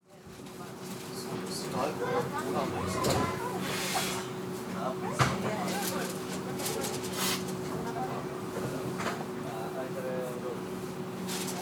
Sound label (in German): Zug Geblubber